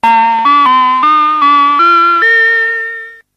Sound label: frost, siren